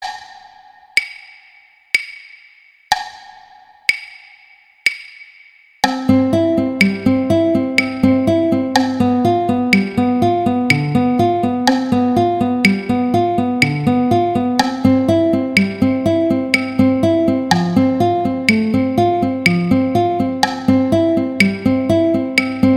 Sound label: Amoroso Violin; Paganini; Sonatas Op1 Vl Gu; Sonata No 1